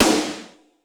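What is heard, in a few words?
GATE SNARE; Crypton - DrumBase5000; GATE KITS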